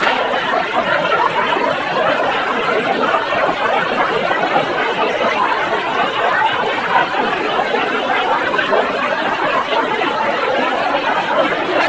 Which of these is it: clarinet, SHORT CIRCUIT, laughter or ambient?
laughter